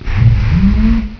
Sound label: Mouth Moto